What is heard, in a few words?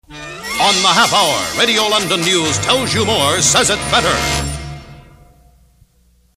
News Headlines, instrumental contains theme; Pre-record (instrumental contains theme); London